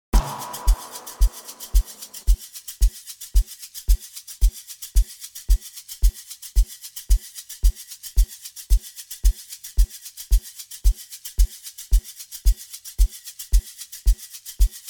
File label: Drum Effects Africa music